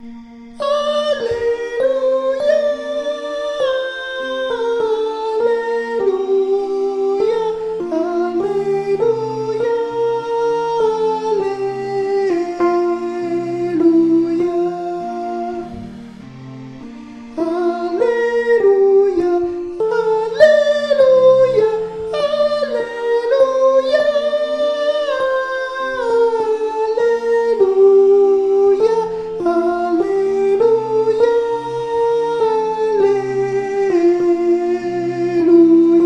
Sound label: Alleluia Soprani; Gabrieli